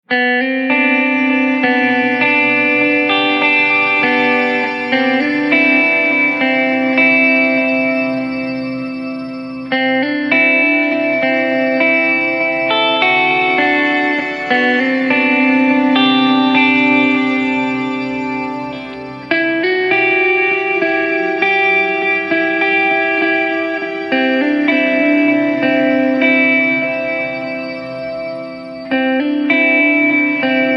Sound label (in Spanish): Guitarra Aislada; Forrest Gump